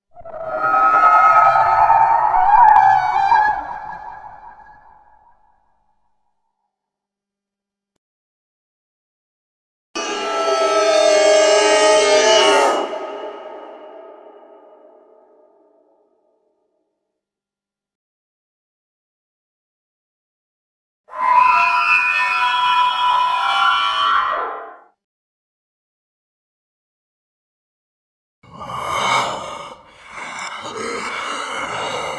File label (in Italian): suoni horror suoni paurosi crepy sound scary sounds horror sounds